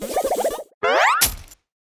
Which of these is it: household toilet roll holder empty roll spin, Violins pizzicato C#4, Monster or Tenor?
Monster